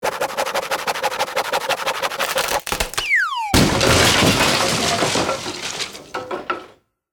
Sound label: Comedy and Cartoon Sound Effects Comedy Saw Floor Fast And Fall